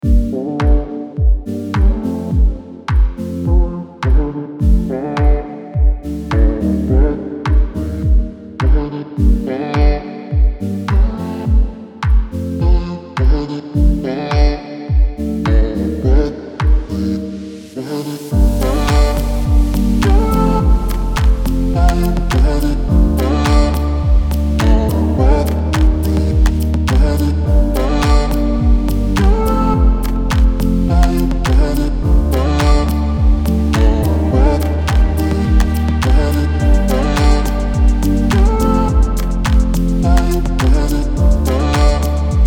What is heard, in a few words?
gardens stylish chill